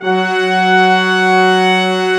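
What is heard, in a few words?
BRS, Orchestral Ensemble; Roland L-CDX-03 Disk 2; Orch.Ensembl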